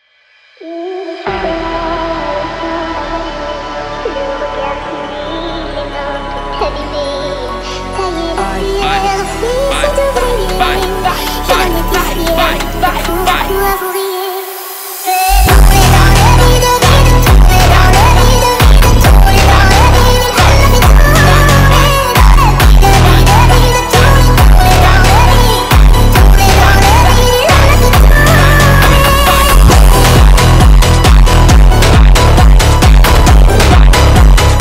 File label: Phonk funk